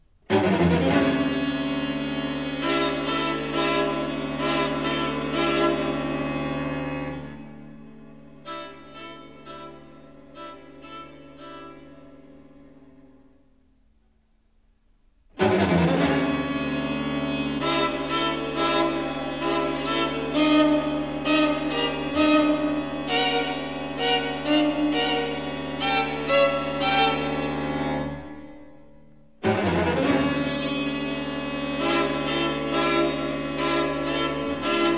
String Quartet, Recitative (Adagio)

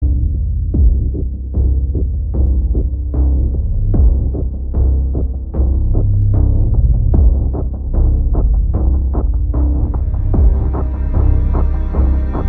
electronic music; Mr. Robot, Vol. 5

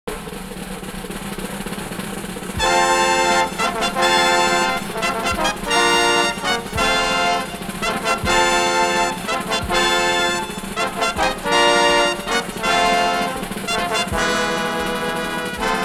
Fanfare; jazz

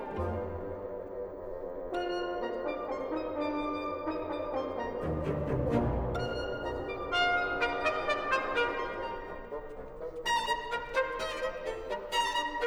Pulcinella, ballet with song, orchestra, Allegro

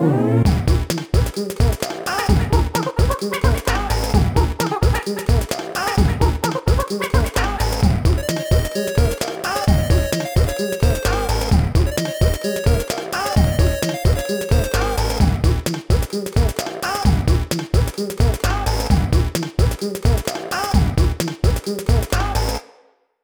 Diddy Clear; Donkey Kong Country 2- Diddy's Kong Quest

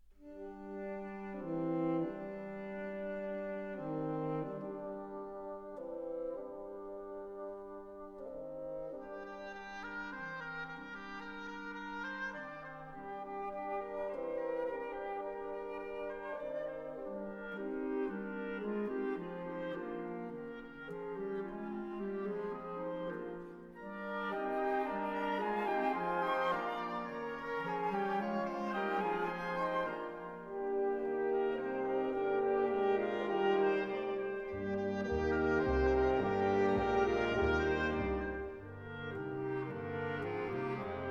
Wind Orchestra, Dance; Grainger Edition Vol 4; Faeroe Island

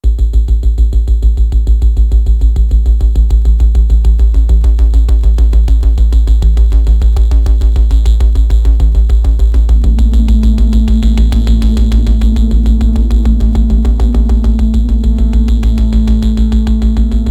electronic music; Mr. Robot, Vol. 4

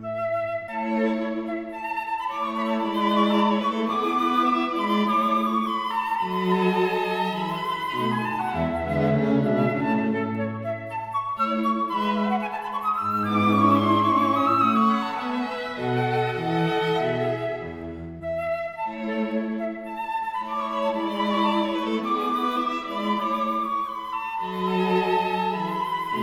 flute, String Quartet; in A, K.298; Variation I; Mozart Flute Quartet

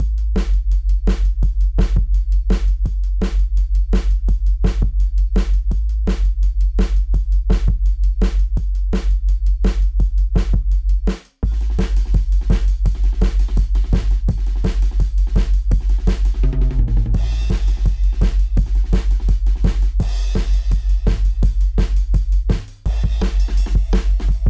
dnb wubs

